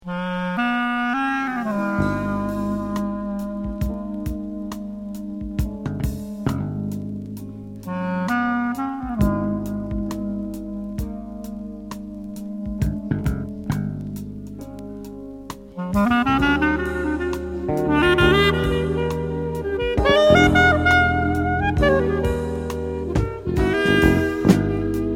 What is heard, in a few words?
clarinet; woodwind